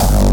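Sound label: drum kick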